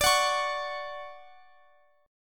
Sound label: D-Major 7th, D, Guitar, Drop D